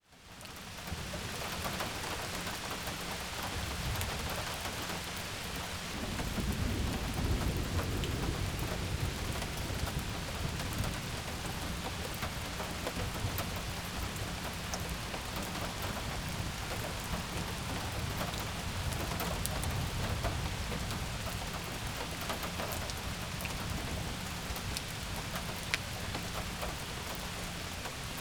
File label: RAIN